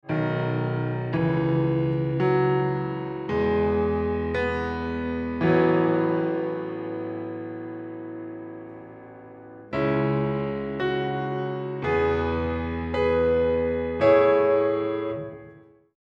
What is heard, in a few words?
Piano; ReverbSOLO